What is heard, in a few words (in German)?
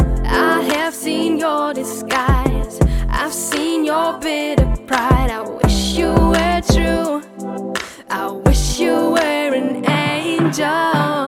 vocals mit beat